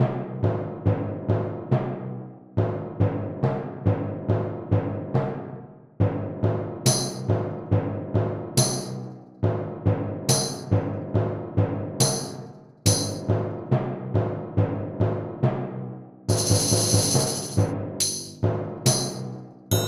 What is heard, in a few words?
Viking March, This little viking march; viking march was part of an abandoned game project